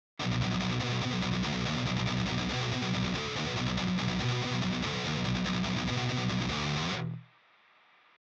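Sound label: neck dist, electric guitars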